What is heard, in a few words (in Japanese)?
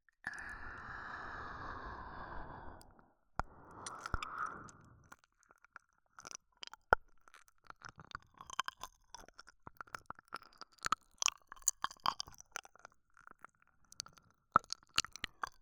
耳舐め リピート版, ASMR